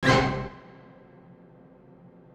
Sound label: Stabz, FX